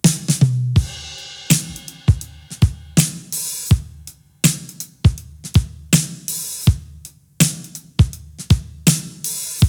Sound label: Westcoast Studio, recording session drummer; marc van bebber schlagzeuger session drummer producer studio musiker